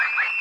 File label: radio random, prison